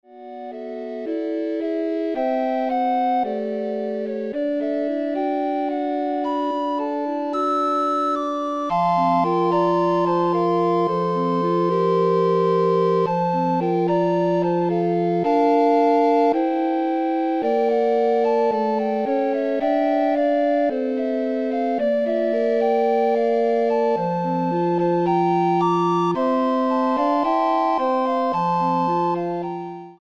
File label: Recorder Ensemble